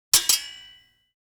sword scrape double; fights bodyfalls; Asian Show Sound Effects

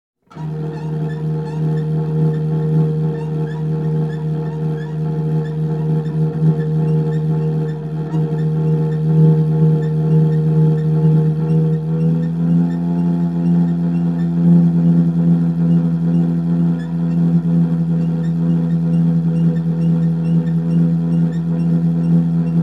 Intonarumori ululatore hooter; Luigi R

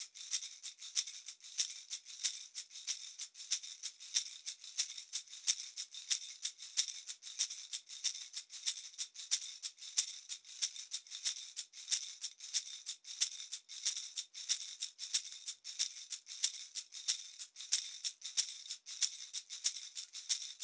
Small shakers; Stars (Tachenump)

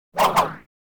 swish electronic; crashes swishes impacts; Asian Show Sound Effects

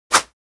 swish single; Asian Show Sound Effects

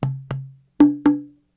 Cahon

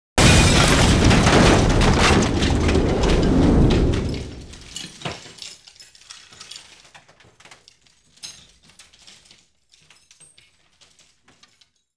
impacts large, foley effects; Asian Show Sound Effects

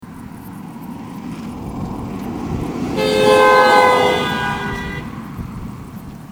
doppler

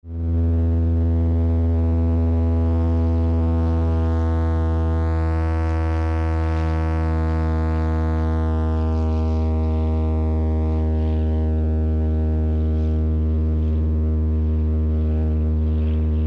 single engine

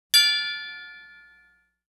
large high pitched bell; beeps bells buzzers; Asian Show Sound Effects

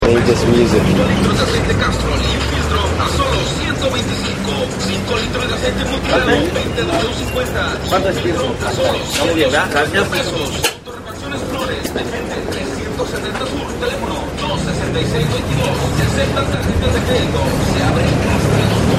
radio

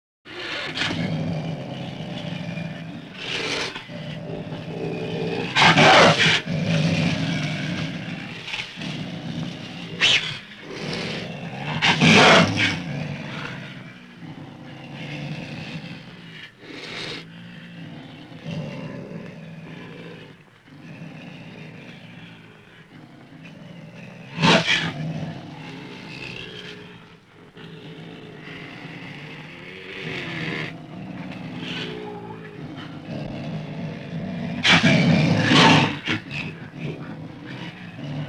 wildcat hiss

